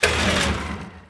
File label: spindown, laser; weapons